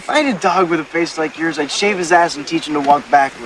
backward

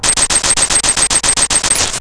rupee chest; zelda

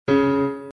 c#1, piano

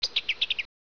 night bird

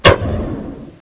light switch

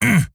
pain; player

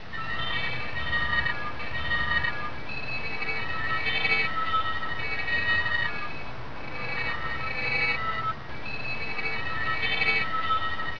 squeeks

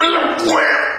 dying bird; ff hunted

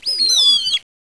rat sound

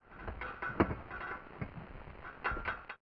millwheel

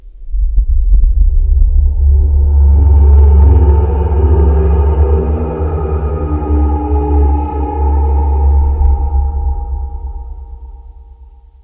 ghost sound

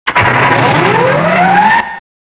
power turn on; ambience

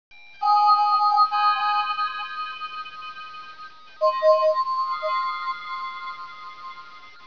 energy pit, ambience

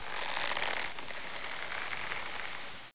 rope

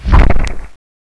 bronto foot, ambience

